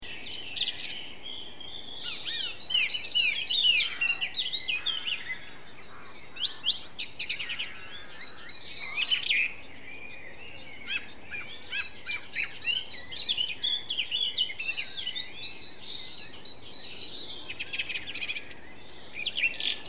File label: Song Thrush; field-recordings